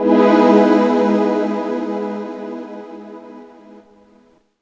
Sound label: Emaj9, Chords; Electronisounds